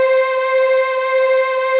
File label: SYNVO; Greytsounds; Scott Peers Sound Engineering